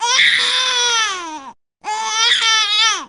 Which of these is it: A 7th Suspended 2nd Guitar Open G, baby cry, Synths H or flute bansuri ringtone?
baby cry